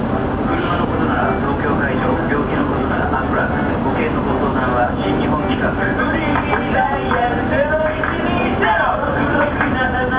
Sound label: song